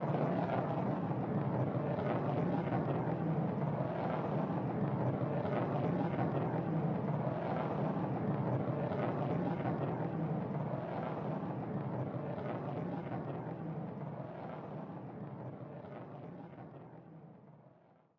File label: Player Hand Roll Slow